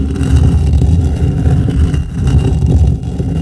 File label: stone, surface; WhiteOut